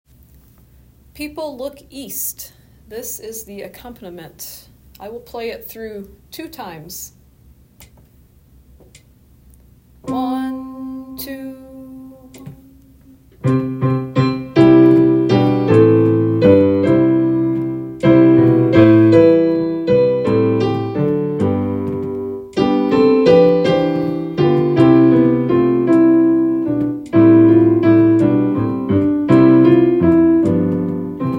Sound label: accompaniment; People look east